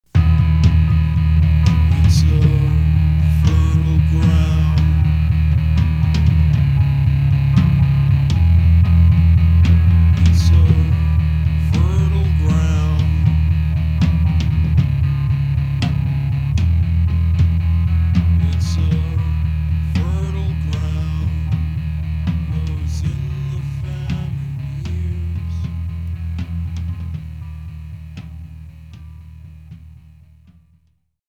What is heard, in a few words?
fill